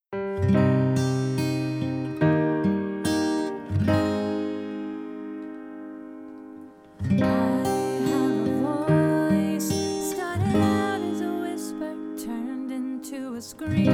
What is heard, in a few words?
alto